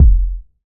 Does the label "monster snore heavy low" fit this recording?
No